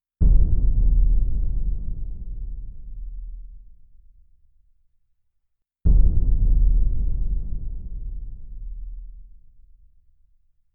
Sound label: sonic boom